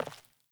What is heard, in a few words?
Sandals Walk Default; Characters; Player